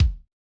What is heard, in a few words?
Bass Drum L; Yamaha DJX-2B